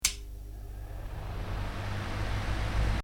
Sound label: Fan: Large Desk Fan Switched On; Electronics; Stuart Duffield